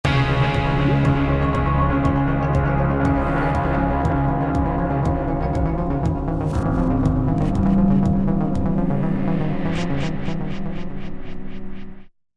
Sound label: Music Complete Mix; The Warriors Street Brawl; xbox 360